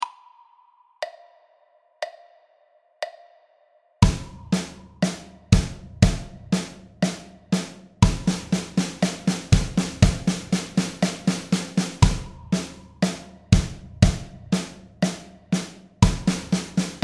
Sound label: 4 way, drum